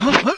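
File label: menu change; 5element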